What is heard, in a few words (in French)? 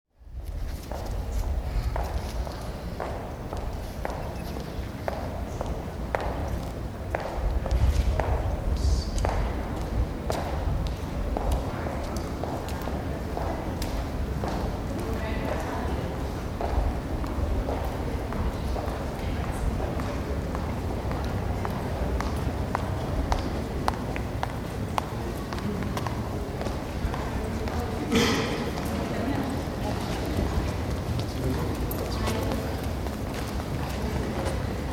sol urbain porche cour carree